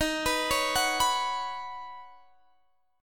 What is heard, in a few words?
D sharp Minor 7th Sharp 5th D sharp, down, Guitar, Drop D